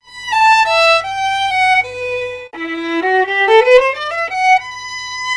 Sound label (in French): violon